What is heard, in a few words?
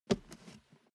step barefoot metal single left